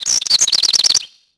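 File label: ambience bird; Tony Hawk's Project 8; XBOX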